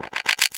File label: SPACE CLAP, Electro Perc; E-MU Formula 4000 Series Vol. 3; Analog Odyssey